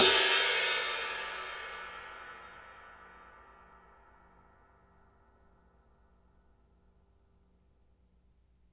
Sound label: ride Hi